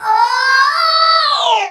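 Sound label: vocal, Drum & Bass, 140 BPM; Ueberschall; Drum & Bass Flash Trackz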